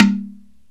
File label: AFRICAN INST; OMI - Universe of Sounds